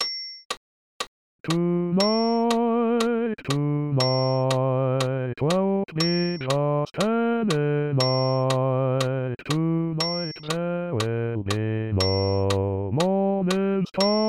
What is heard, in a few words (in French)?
Basse; West Side Story